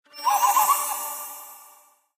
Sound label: win low